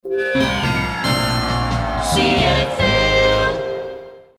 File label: downsweep